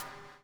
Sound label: BUBL, BUBBLE; Spectrasonics - Backbeat